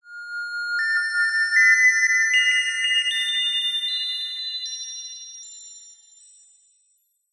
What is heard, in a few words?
musical magical mysterious slow acending beeps digital; zapsplat